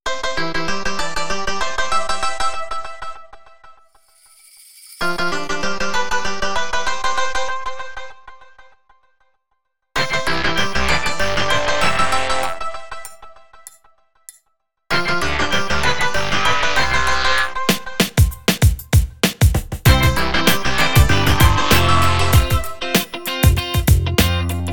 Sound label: Bouncing; SE Promo Bouncing; Sony Ericsson; PlusFourSix; SE Sound Project